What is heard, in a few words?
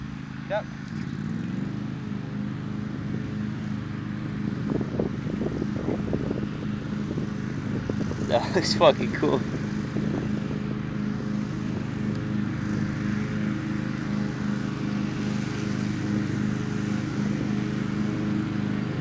LAWN MOWER